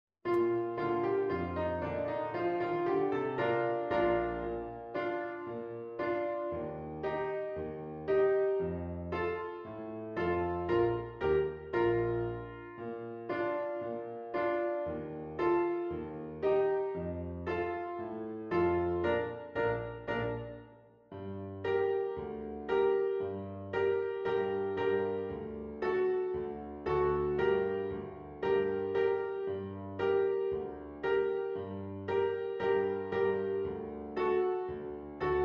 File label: tuba dance piano